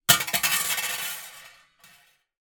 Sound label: impact metal grill on metal surface